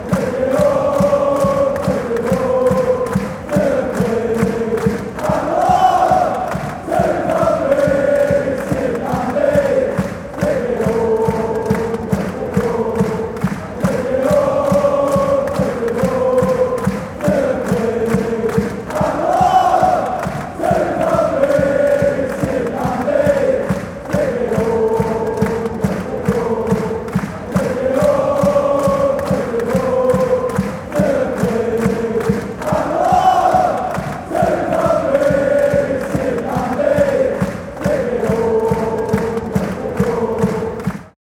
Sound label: take me home, chants